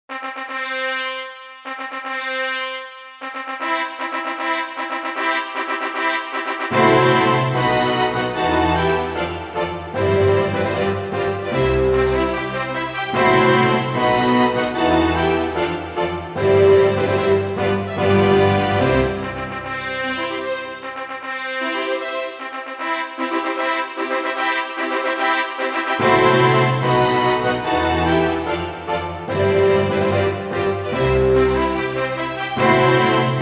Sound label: wedding march; mendelssohn